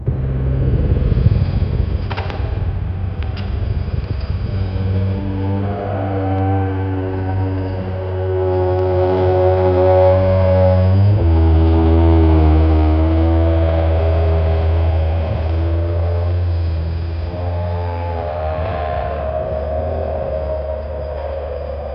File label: deep forest ambience slow; wood cracking